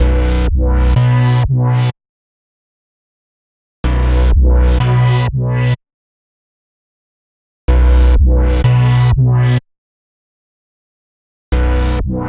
125 Bm Bass, electro synths; Prime Loops; Complex Electro Synths; Focus

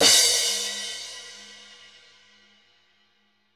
Crash, Cymbals

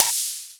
Ride, Dubstep One-Shot Drums

